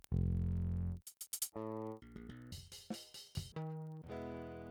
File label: Saturator; Virtual Analog